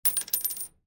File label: Gun Shell Drop; soundscrate